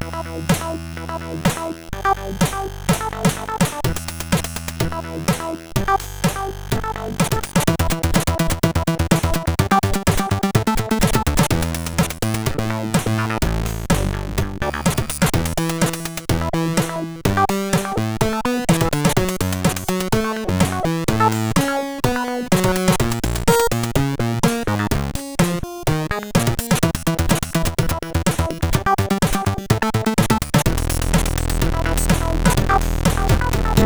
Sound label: Untz, CSG8580R5